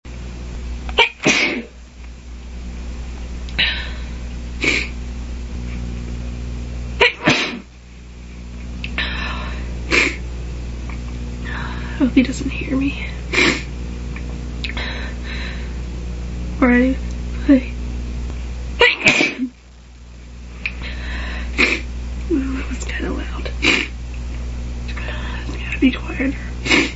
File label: muffling sneezes, female